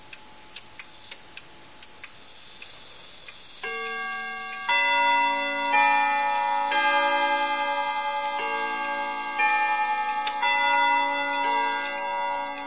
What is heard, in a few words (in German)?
Westminsterschlag 30 Minuten Beispiel